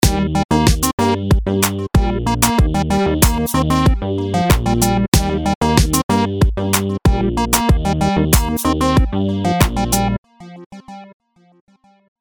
MELODY SMAL RANGE Yoga Ball Boyncer

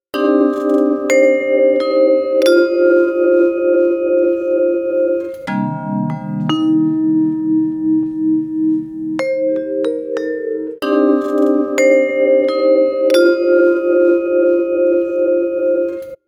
vibes

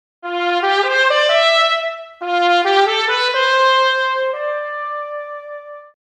Trumpet

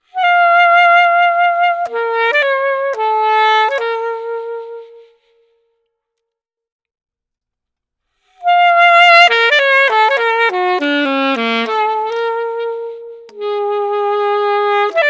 alto example

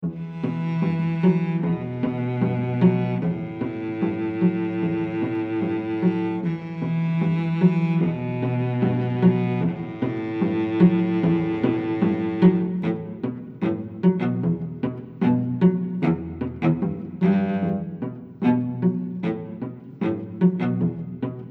Cello Commuter Bus; Tuesday

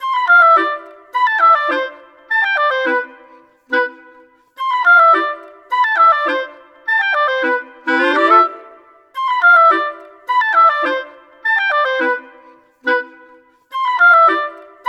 WOODWINDS

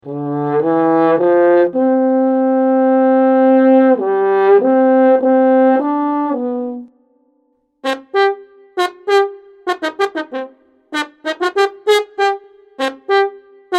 French Horn

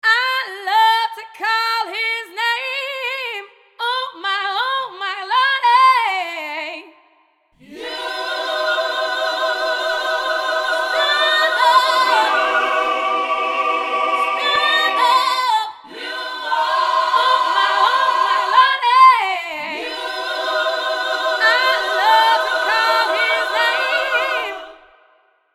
Gospel Sample

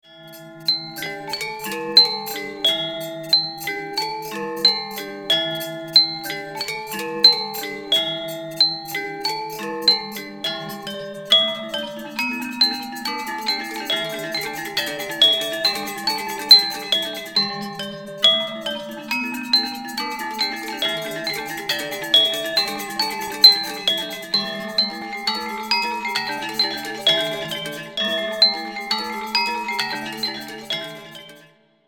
gamelan ensemble

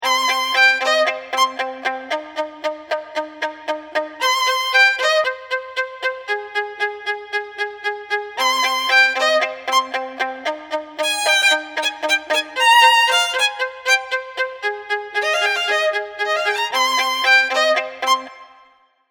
Violin

